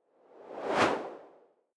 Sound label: whoosh simple high